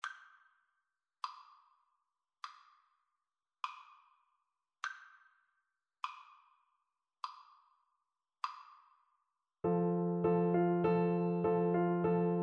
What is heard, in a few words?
bach sheep fl kar, Flute